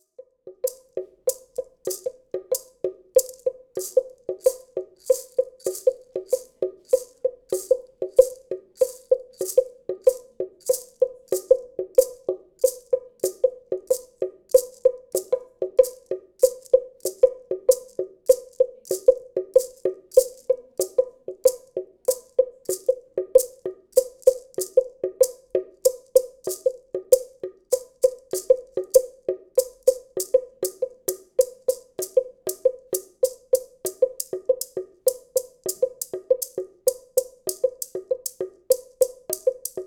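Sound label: Prehispanic Percussion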